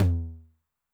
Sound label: Noise Med, 909 LOW TOM